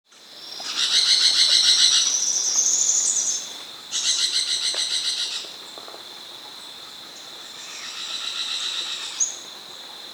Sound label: Smoky-Brown Woodpecker (Veniliornis Fumigatus), Andean Birds; S. America